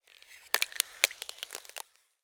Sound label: nature ice crack